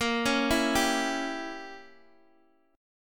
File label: A sharp Diminished 7th, down, Guitar, DADGAD